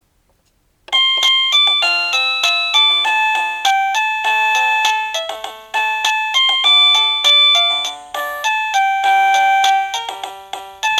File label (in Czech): melodie